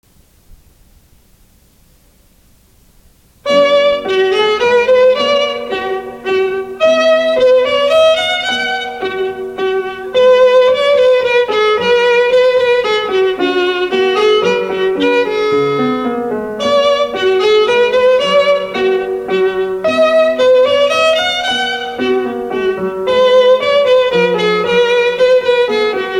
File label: minuet